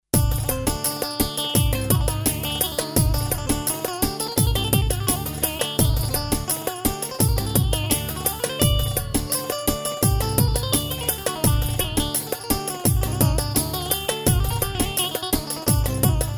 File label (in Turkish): ciftetelli, pa500 tr